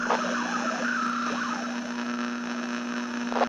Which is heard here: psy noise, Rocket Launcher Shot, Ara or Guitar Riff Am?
psy noise